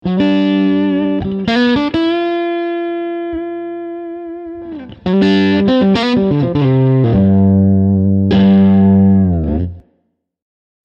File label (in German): Blue Note Röhren Amp OD Lead; König